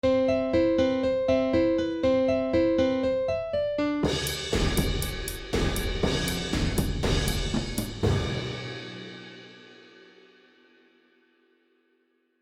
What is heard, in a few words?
percussion; Etude 2.3